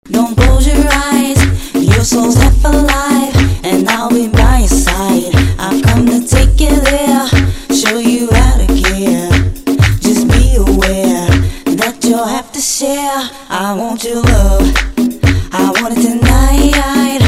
Classic Club Party Mash Up

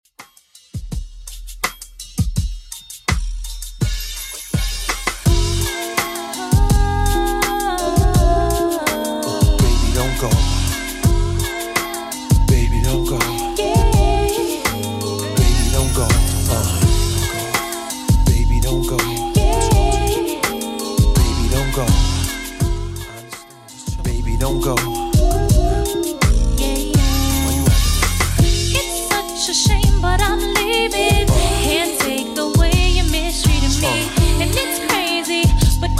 RnB 83 bpm; Redrum

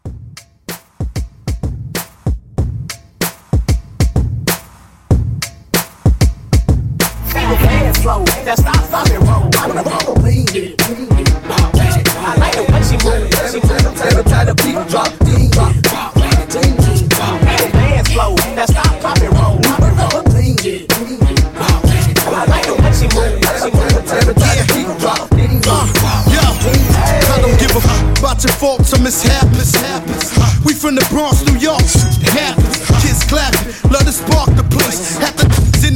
Throwback Hype Re Drum 95

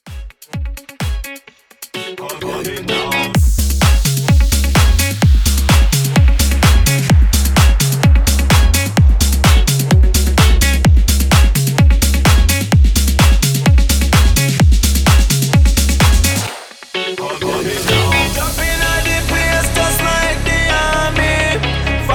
VIP CUT 128 BPM

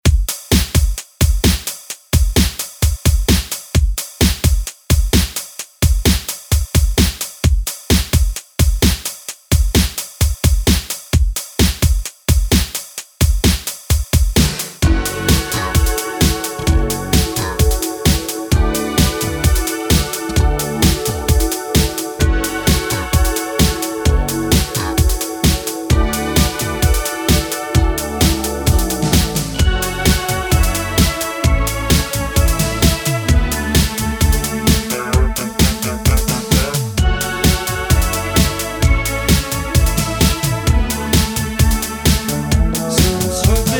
80s 130bpm

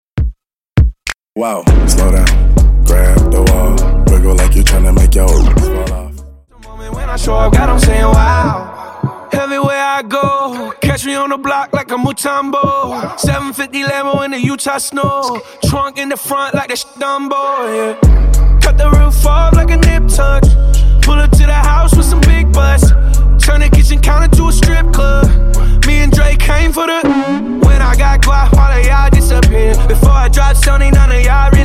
100 128Bpm; Tranny

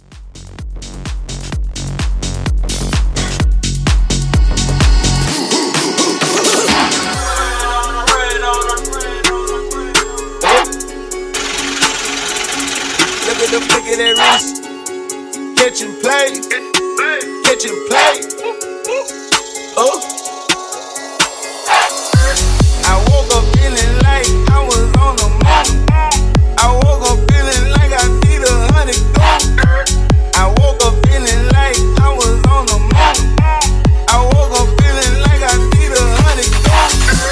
Club 128

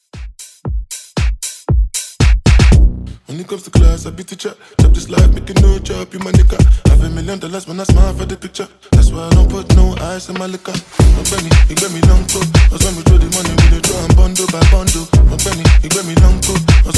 Dance Redrum

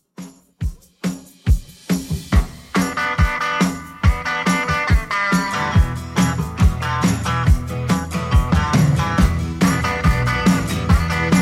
60s Redrum 140bpm

